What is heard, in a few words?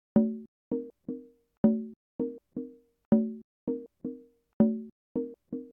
bongos and congas; percussion loops; resample